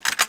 gun stance

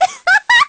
laugh; uzuki

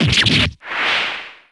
superdamage; crogrey

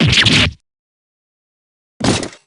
fall; dgs2-drebber

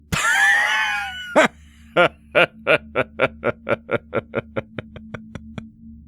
laugh

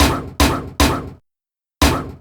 laugh; aura

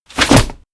parasol open

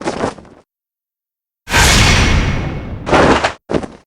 full transform

